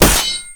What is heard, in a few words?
sword impact; Dota 2